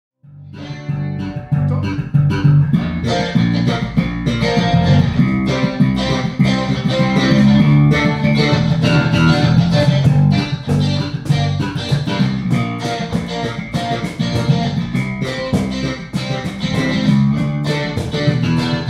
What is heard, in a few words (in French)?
Rythmique solo